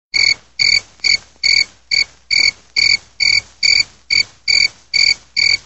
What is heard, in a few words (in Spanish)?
tono de grillo; ringtones; latino